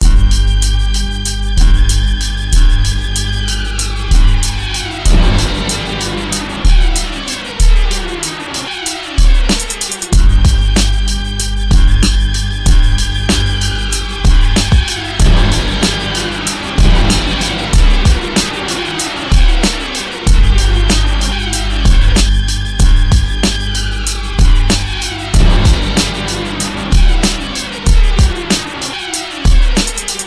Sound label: beat